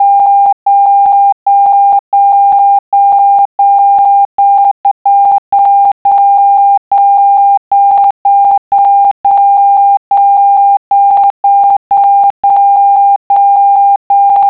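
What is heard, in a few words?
cq cq cq de df2jb df2jb df2jb, morse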